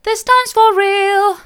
this times for real mid, vocal

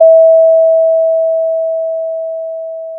pop, Sound of a school bell

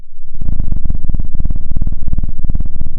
pop, Sound of a cat purring

